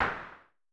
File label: SN Filtered, Vermona Mono15 Drums Snare